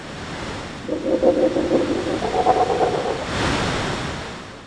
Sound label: wizard storm gust